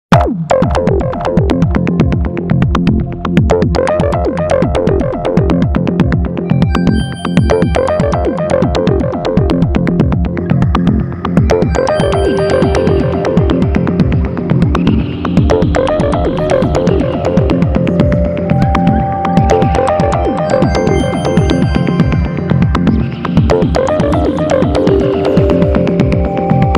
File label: Analog